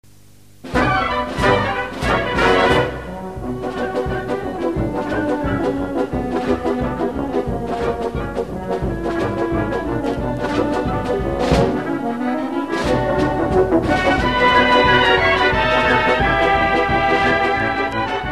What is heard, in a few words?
Horo; Bulgarie